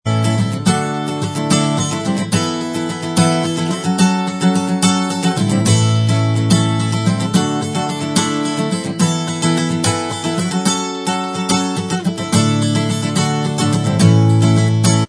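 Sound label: acoustic instrumental, backing track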